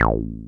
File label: TB BASS